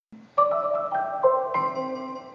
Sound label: xbox 360 avatar confirm